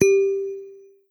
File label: Bottle, notifications; Motorola Moto-G20; lenovo